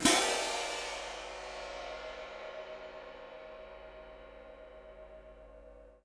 Cym p, Cymbals; PowerFX - Downtown Orchestra

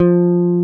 BASS F3; Sonic Reality; American Heartland

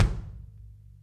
kick, drum kits; Sonic Reality; Interactive Drum Kits; 90s sample cds

